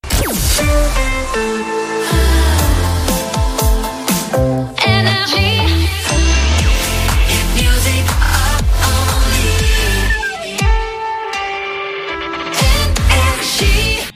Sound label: Jingles; NRJ Shotgun ID (2025)